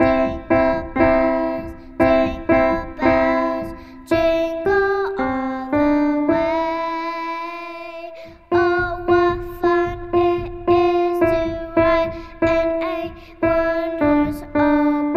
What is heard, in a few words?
Jingle Bells Vocals